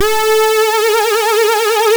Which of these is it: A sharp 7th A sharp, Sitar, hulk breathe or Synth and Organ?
Synth and Organ